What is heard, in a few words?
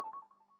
flutter